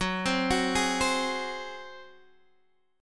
F sharp Suspended 2nd Flat 5th, Guitar, Half Step Down